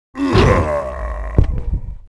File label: Mob die; 거산투영